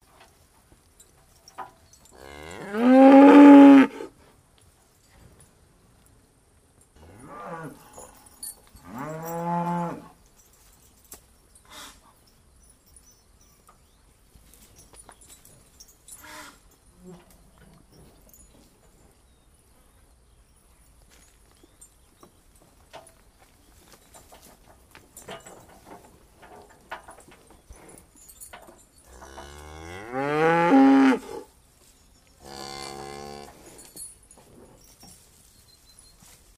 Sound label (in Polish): krowy